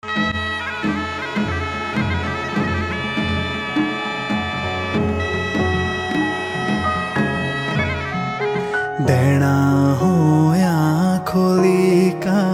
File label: background